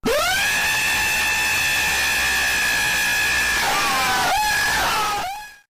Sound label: screaming birdie